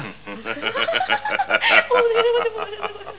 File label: gull